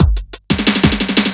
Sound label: Speed loop fill, Drums Beats